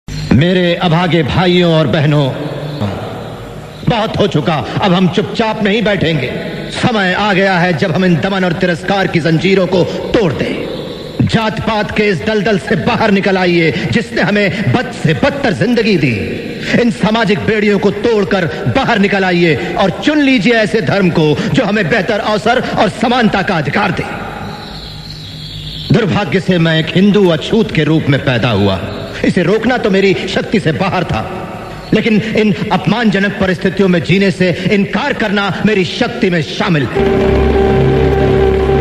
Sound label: ringtone; bheemm